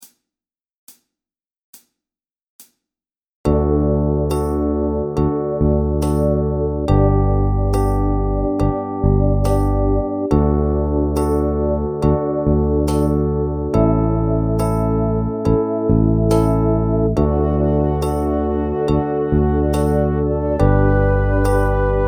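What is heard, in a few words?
Drum Groove Slow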